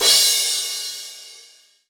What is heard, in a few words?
Crash, Cymbals